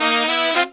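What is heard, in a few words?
Pop & Funk Brass, 110 F, right; Best Service ProSamples vol.25; AKAI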